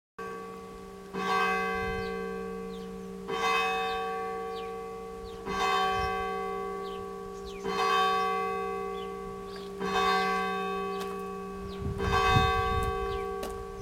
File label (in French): 12 COUPS MIDI; VANNES LE CHATEL 54